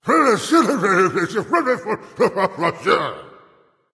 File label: grumbling; smite; voicepack; ymir